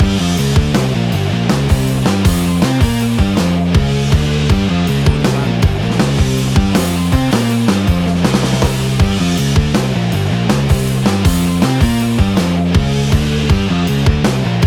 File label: Big Rock Metal Riff